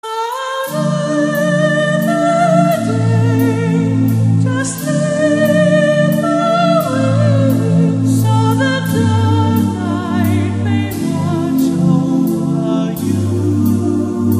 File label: Waltz